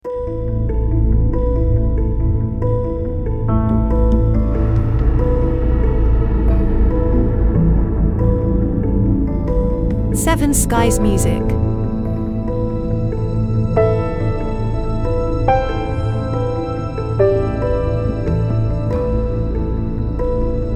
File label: Ambient Suspense